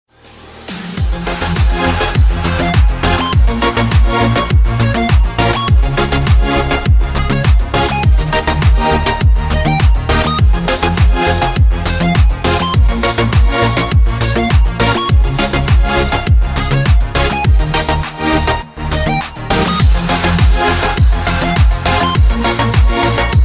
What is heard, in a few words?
sadness electro mix